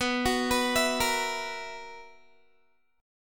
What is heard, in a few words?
B Major 7th Suspended 4th B down Guitar; arpeggiated